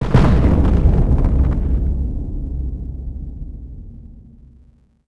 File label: foot echo